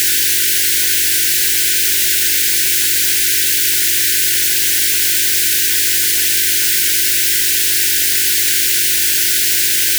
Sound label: noisy msg